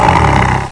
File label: TIGER